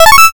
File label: start